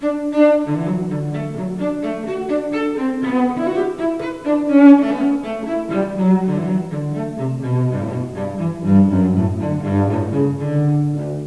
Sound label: Courante, cello; Bach Suite 6